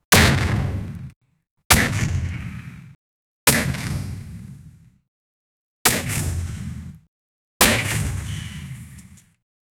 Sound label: a series of heavy blows; bullet